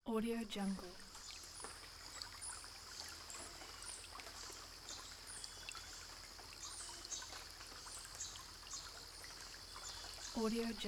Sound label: sound nature